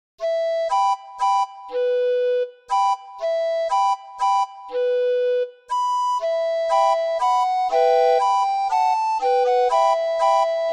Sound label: Marusya, orgue barbarie